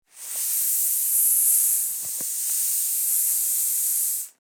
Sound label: Rubber ring deflating air release; inflatable